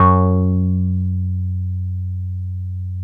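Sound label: OB8 piano F#1, OBERHEIM OB8; Big Fish Audio - First Call Vintage Keyboards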